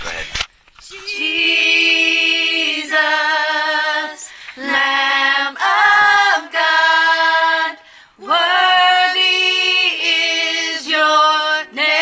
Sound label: all alto